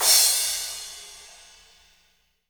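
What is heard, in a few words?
cymbal crash; Best Service Gigapack 2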